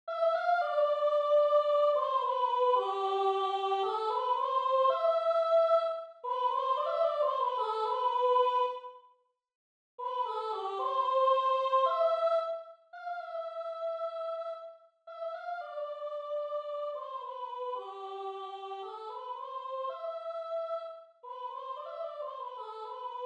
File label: soprano